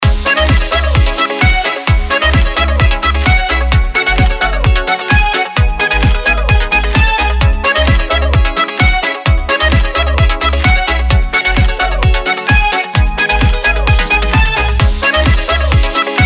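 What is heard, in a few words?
beautiful musical interlude, very beautiful interlude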